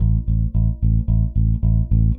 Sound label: Eighth Notes A, Bass; Construction Kit; Bass X